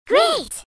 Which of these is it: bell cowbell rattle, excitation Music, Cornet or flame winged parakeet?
excitation Music